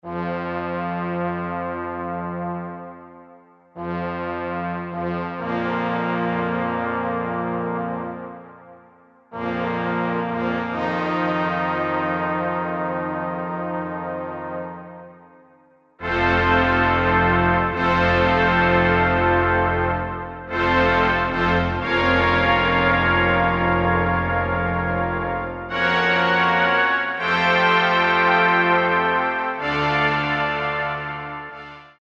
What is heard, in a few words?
Wagner Chorale, Roland JD-990; Factory Patches